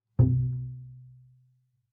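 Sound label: Bass pizzicato fortissimo sul E C3, Double Bass; MIS Pitches - 2014; Strings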